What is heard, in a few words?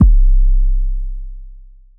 808, tom